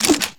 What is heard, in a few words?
smoke grenade; LoL; graves